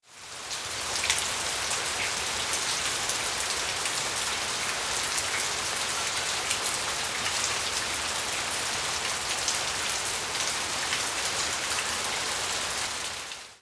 rain

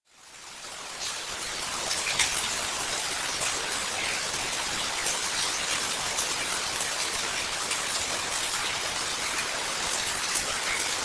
rain

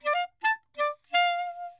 clar

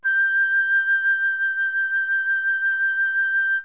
flute G6

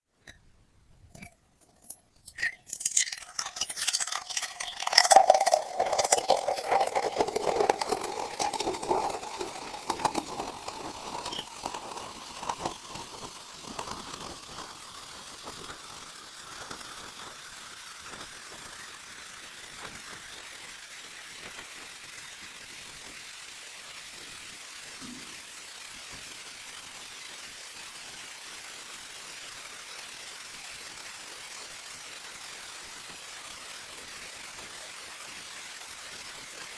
bottle

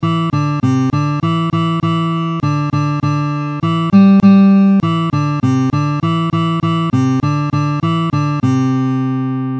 plucked

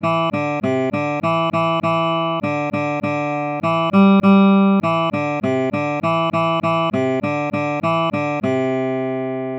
picked

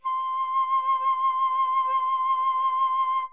flute C6

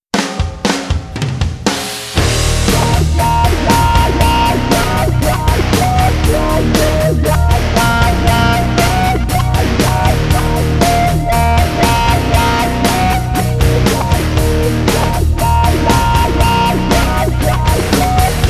distortion

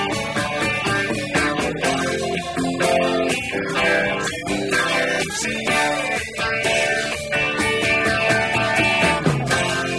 novocals

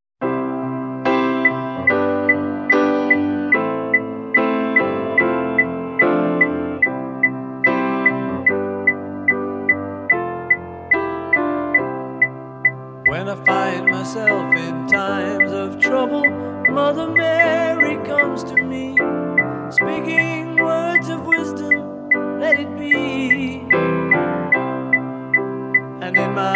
blip

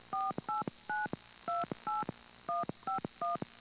dtmf

